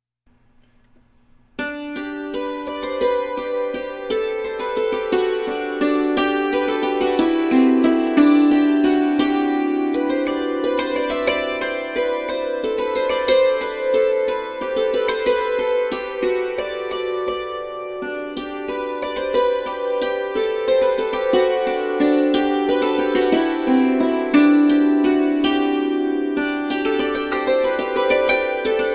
Hammered Dulcimer

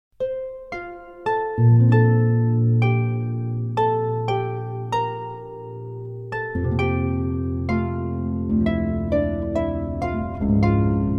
Classical Harp, with voice

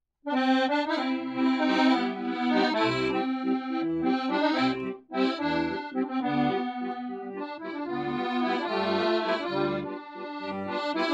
Playing French Style Music, accordion

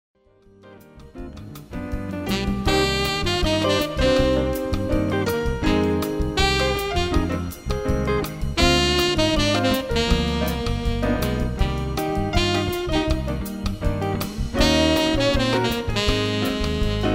Variety Band

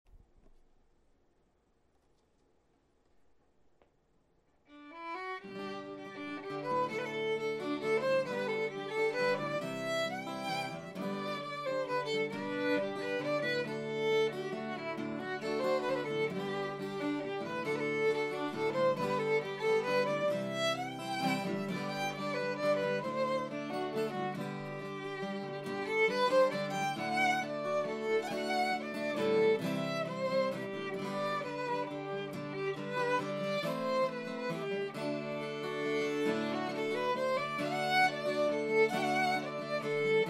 Waltz, celtic band

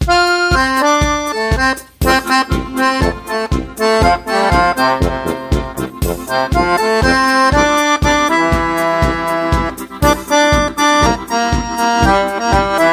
Polka; Dance Band

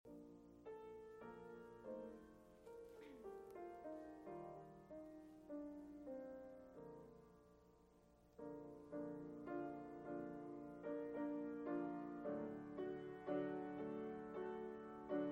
Hymn, Opera Singer

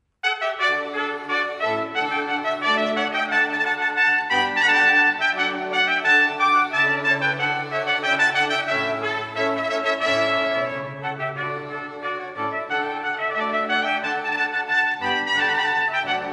String Quartet 2 Trumpets; Rondeau; Mouret; Classical

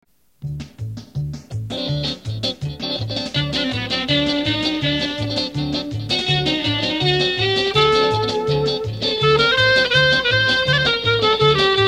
Klezmer Frelich; jazz band; Jazzmasters

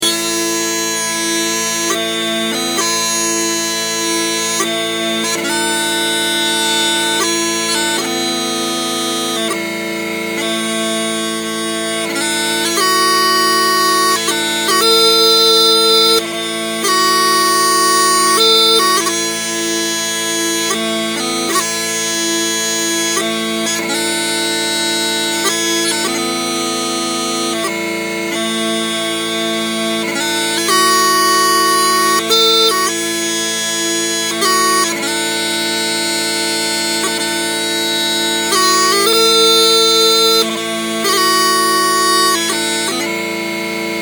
Small Pipes; bagpipes; Jared Malone; bagpiper

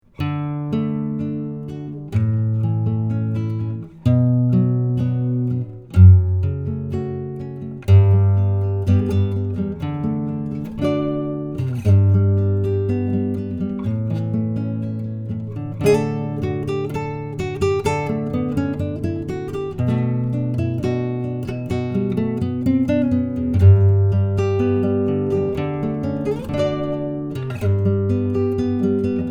Wedding Guitar; Flamenco Brazilian Classical Guitarist; Canon in D

